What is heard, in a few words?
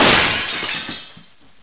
window smash